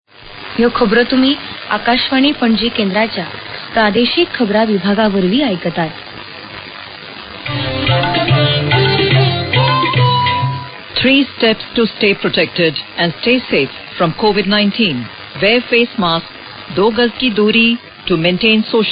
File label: interval signal; akashvani panaji